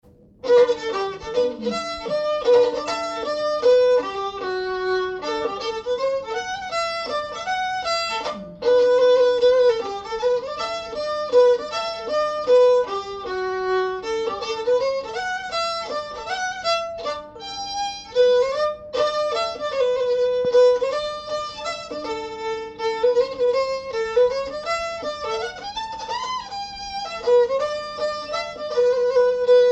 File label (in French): mazurka; mazurka du gaudry